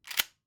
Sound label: Shotgun Open; SoundsCrate